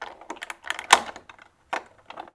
odemceni; Mafie 1

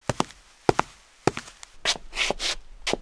kroky; Mafie 1

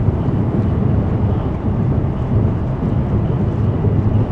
zachod; Mafie 1

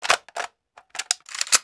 nabij; nabij sam; Mafie 1

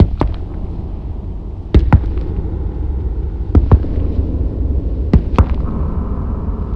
kroky; Sam kroky; Mafie 1 cz

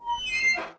vrznutí; Mafie 1

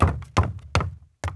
schody; Mafie 1

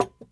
polozeni; Mafie 1

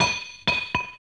granát; Mafie 1

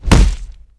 kop do tela; Mafie 1

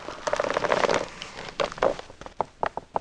aerostat; Mafie 1

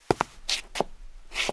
z auta; Mafie 1 cz

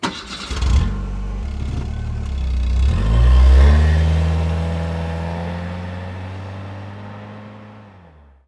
auto rozjezd; Mafie 1

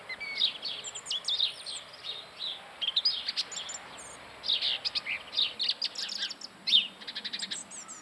ptaci; Mafie 1

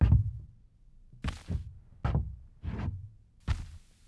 po zebriku; Mafie 1

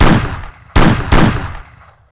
strelba; Mafie 1

